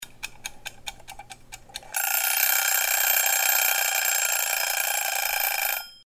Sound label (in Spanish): reloj